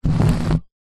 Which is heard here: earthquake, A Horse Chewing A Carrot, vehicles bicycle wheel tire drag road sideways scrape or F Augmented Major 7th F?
vehicles bicycle wheel tire drag road sideways scrape